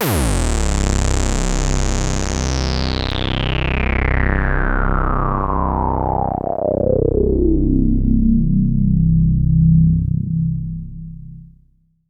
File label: EFX, SFX KIT; Wizoo - Platinum 24 Electronic